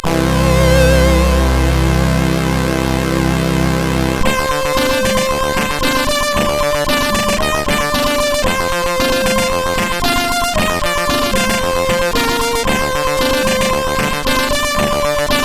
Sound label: E-Load loader, CSG8580R5; hvsc